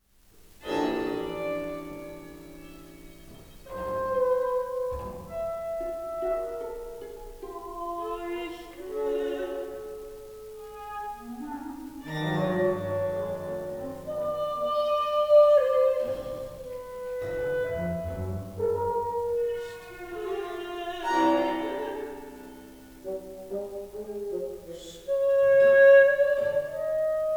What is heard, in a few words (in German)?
Frauenstimme 8 instrumente; Goethe